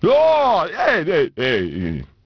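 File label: male happy; People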